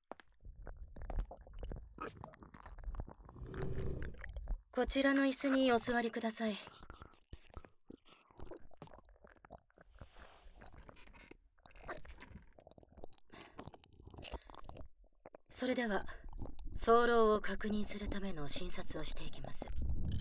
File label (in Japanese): 耳舐めされながら低音無表情ナースにディープキス・手コキ・オナホコキ・フェラされる音声